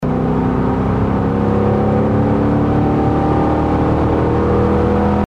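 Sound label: corsa interior acceleration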